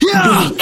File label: voice male attack groan